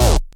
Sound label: bass